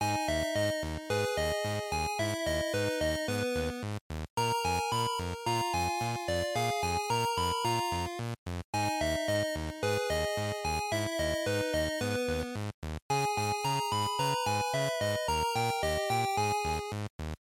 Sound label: Game Over Loop